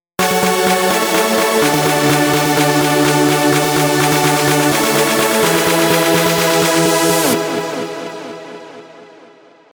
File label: Chord No Cut Off